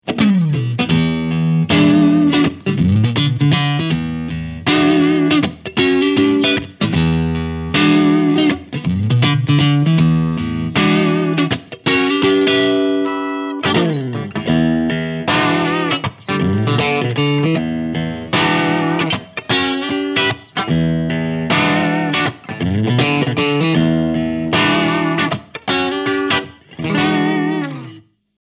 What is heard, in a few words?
Nano Heads Vintage Clean No Sagging; HughesKettner